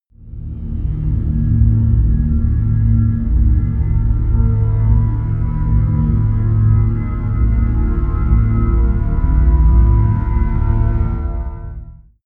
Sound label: promo pad